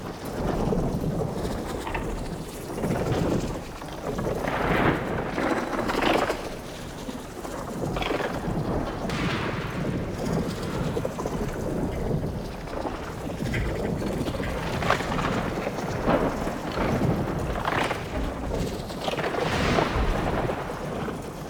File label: Barrier Interact Loop; Puzzles; Wevetwood